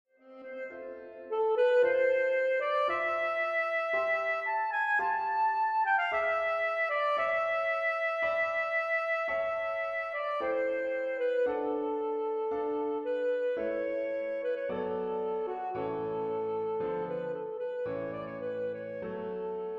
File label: Etude Vocalise Ending; Faure, Etude-vocalise, conclusion; Saxophone Solo